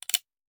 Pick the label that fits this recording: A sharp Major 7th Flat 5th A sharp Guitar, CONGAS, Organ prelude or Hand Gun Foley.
Hand Gun Foley